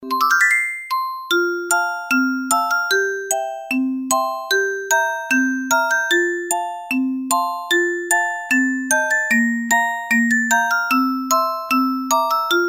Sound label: musicbox nursery; children